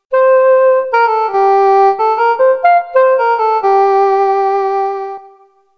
Soft Soprano Sax

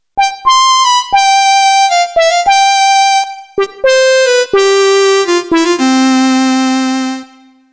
Square Growl

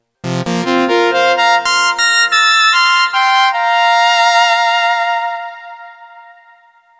Fifths Saws

